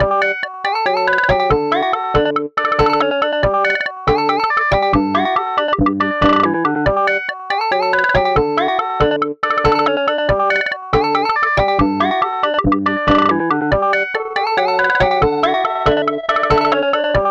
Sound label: ringtone